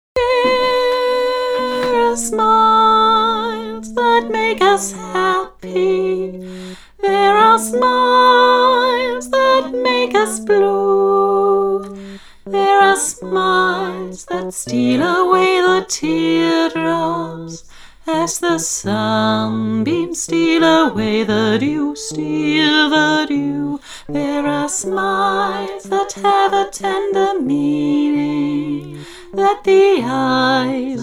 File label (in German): Bass laut